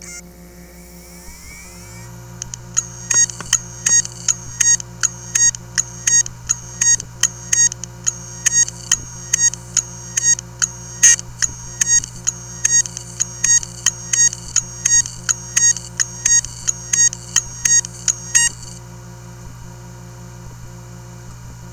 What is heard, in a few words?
Maxtor click